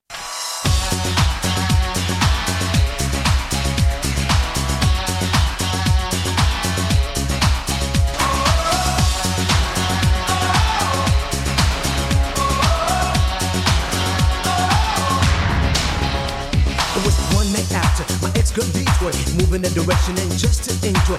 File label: Disco